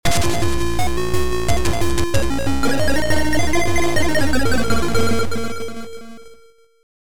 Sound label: Intro muzak